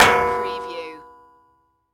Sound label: Impact Metal